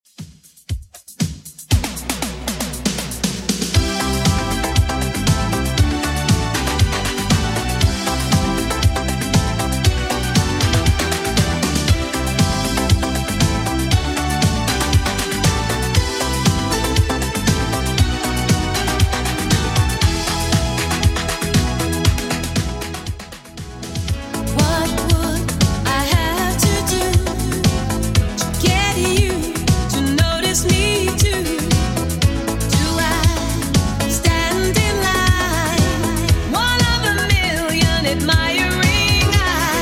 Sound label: 80s Redrum 118bpm